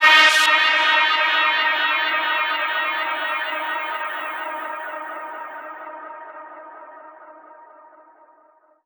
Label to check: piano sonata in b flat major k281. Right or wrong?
Wrong